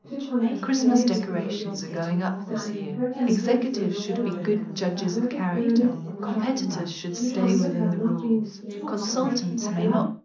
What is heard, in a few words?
female, 380ms 4cm; SiSEC11; underdetermined